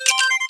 Camcorder Start; Samsung; GT-I7110 Pilot

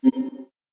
ui basic close, slider tones; Motorola AURA-R1 Celestial Edition

